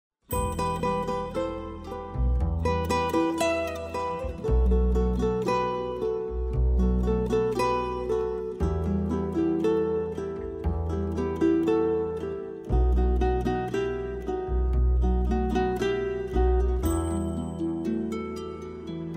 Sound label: Ringtone Mobile